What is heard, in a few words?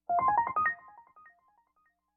Sent Mail, notifications; Asus Zenfone-3-Deluxe